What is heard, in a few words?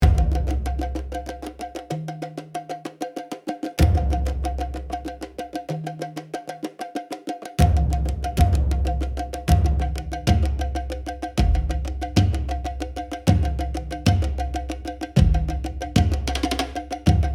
Travel percussions nomad heart